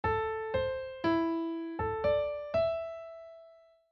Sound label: Motif